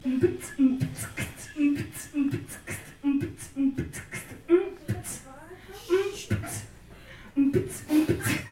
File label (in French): beatbox